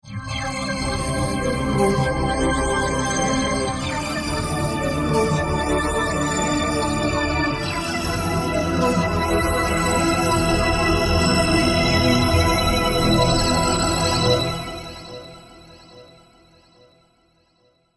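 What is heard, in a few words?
heaven stereo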